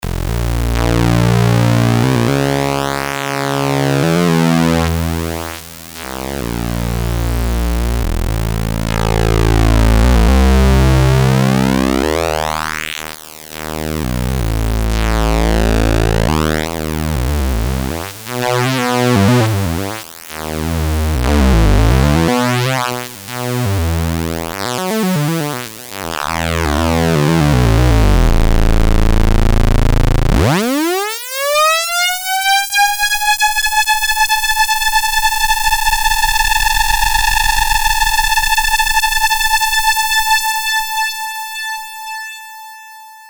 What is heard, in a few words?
pulse